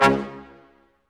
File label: BRS Quintet stac; Roland L-CD702